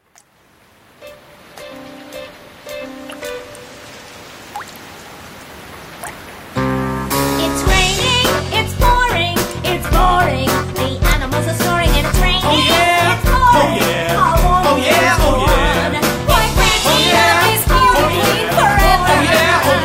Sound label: Rain Song III